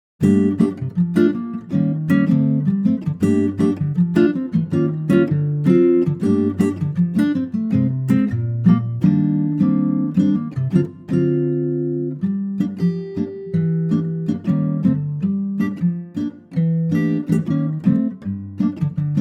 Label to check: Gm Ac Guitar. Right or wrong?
Right